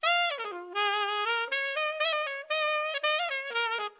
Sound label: bling altsax